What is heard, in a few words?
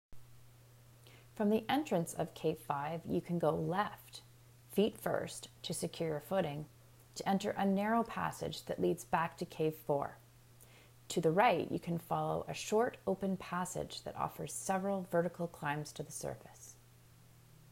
cave